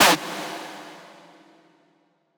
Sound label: synth screech oneshot D#; Synth Hits; GhostHack